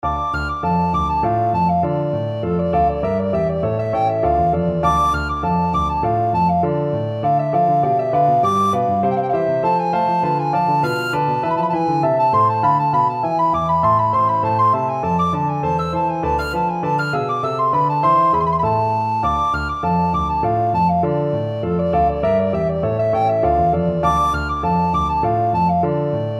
bach badinerie, alto recorder

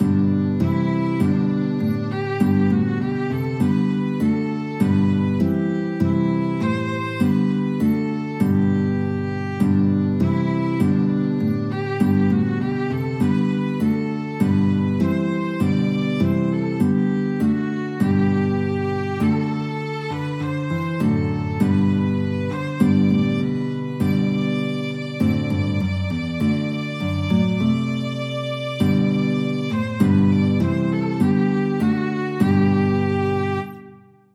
GUIT VLN, violin guitar duet

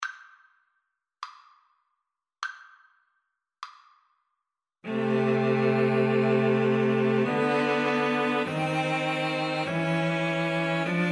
Cello Trio; bruckner aequale1

